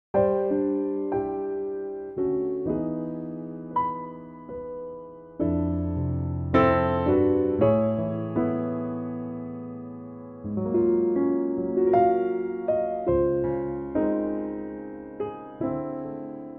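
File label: C piano track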